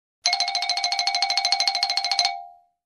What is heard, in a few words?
Funny xylophone comedy running sound effect